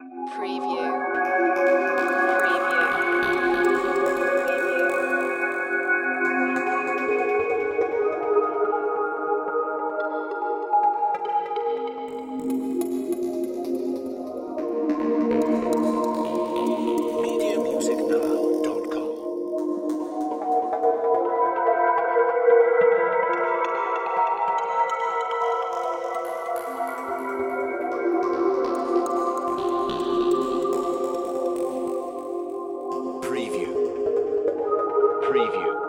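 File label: Production Element Bed Synth; Sound Effects Bible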